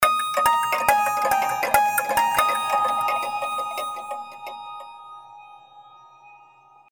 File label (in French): ringtone horloge